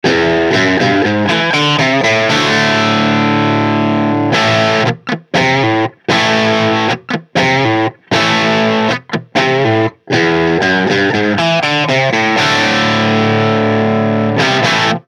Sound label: David Bray Amp